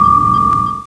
death; qbot

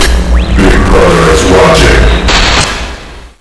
speaker

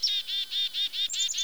coast bird; baseq2; lostcoast

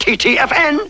death; tigger

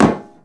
land

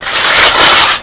grapple killing; lmctf

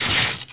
zap; spells

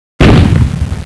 boom echo

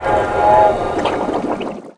air out; lmctf

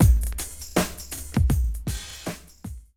beat; xquake

